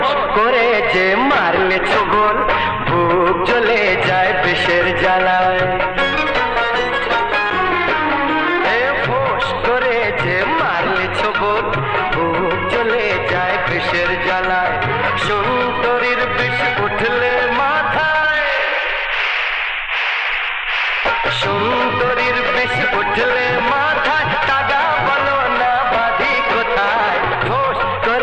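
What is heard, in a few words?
Bengali ringtone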